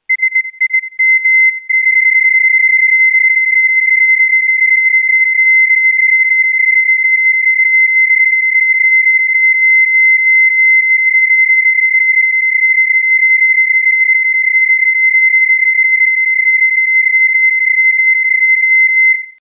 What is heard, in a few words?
SIM31, digital modes; Digimodes